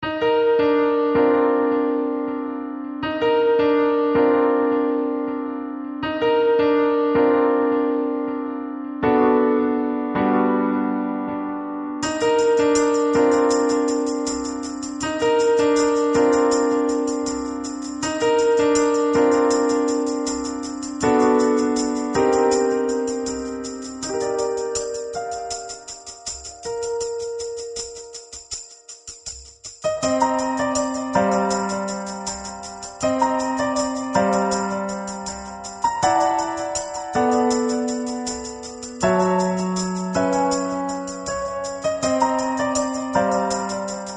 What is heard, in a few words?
Solo Piano Cm 080BPM